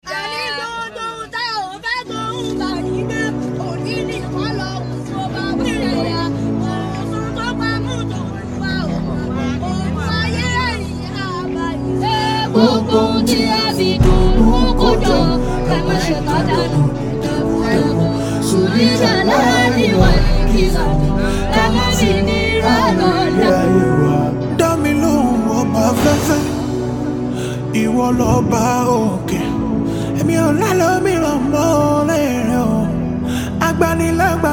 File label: Prayer Song Refix